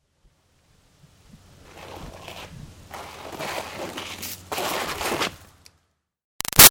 Bicycle Up Foot Drags Stop; Bicycles; Vehicles; Big Room Sound

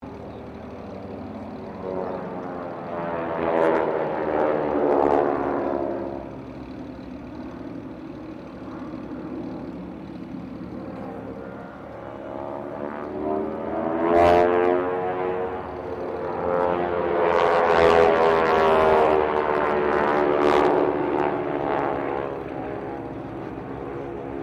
AIRCRAFT UNKNOWN PROPELLAR SQUADRAN PASS; Airplanes; Vehicles

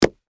Footsteps, Combat, Boots, Stomp, Single, Concrete

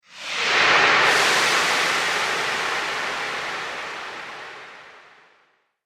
Wave Of Wonder, whoosh

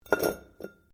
METAL ON STONE SCRAPE; Materials

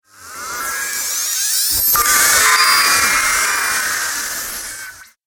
Sci-fi Laser Machinery; Science Fiction Sound Effects

